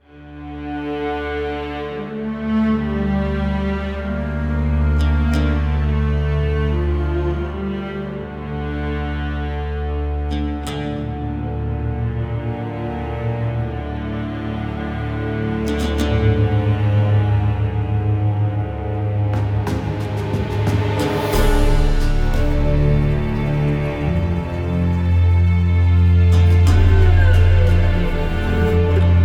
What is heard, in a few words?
Tavern Intro; The Witcher 3 Wild Hunt